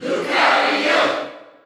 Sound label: Cheer Dutch; Lucario; SSBU